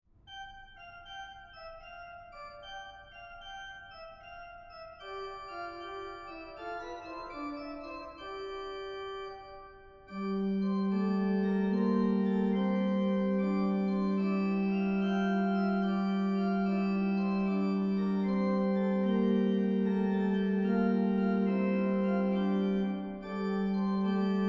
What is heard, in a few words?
jig, organist; Douglas Bell